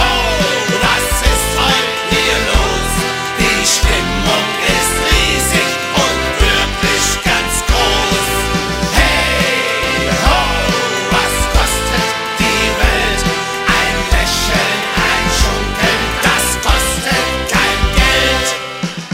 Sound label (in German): walzi walz